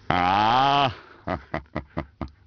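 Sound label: male happy; People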